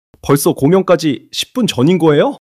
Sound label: surprise